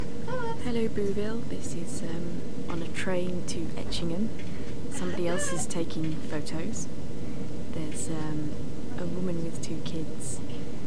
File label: train trolley and telling off; tunbridge wells